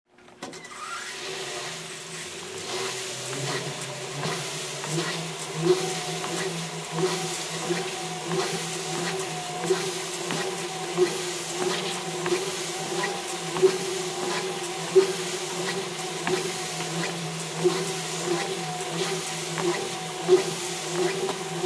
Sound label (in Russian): hodba na begovoj dorozhke